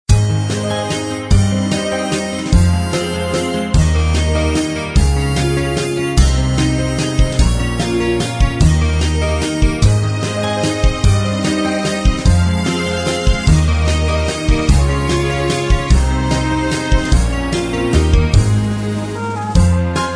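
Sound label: kids tv theme instrumental track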